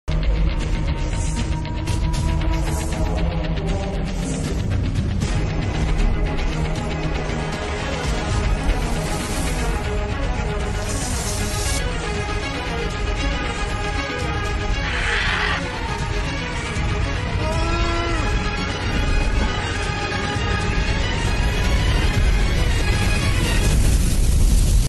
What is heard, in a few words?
malayalam movie bgm ringtone; aadujeevitham; prithviraj sukumaran